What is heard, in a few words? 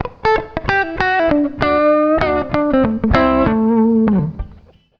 CLEAN, CLEAN GTR